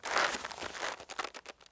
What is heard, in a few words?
Rope Creak, ambience; Star Wars Obi Wan; XBOX